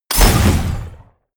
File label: sci-fi plasma cannon fire